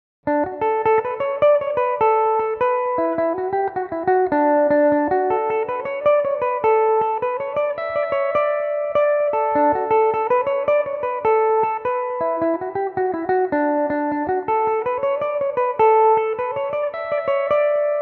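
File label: D major belmuse, irish mandolin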